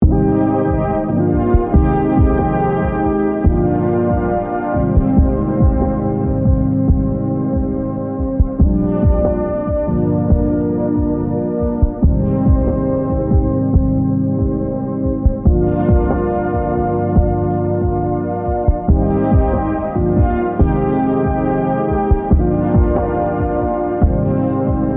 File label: chillout lounge